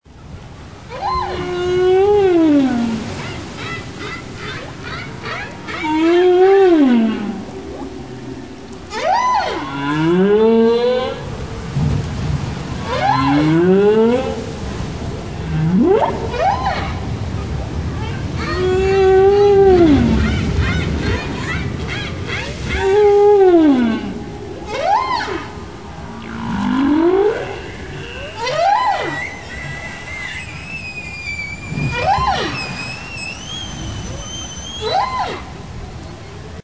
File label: Whale Song